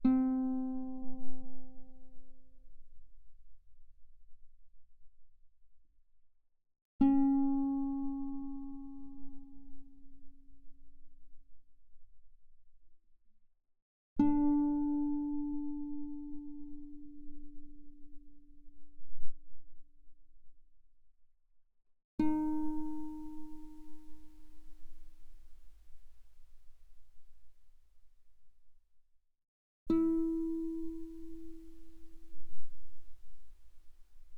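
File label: Guitar mezzo forte sul D C4 Ab4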